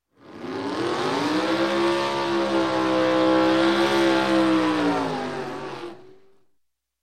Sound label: Dragster Warm Up; Sound Ideas; Series 2000